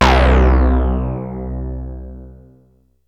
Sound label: Monopoly efx